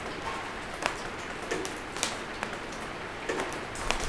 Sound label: rain tin; The Sum of All Fears